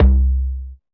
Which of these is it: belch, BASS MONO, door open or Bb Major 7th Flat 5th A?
BASS MONO